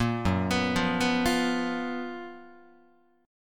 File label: Gb Major 11th Gb, Guitar; GbM11 arpeggiated; JGuitar